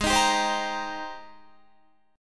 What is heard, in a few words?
Ab Major 9th Ab down Guitar 4E 4A 5D 5G 5C 6F; AbM9 strummed; JGuitar